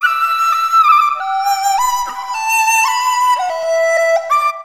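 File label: CH FLUTE, Chinese flute; Soundscan vol.60; Asian Traditions; AKAI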